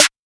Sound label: snare future bass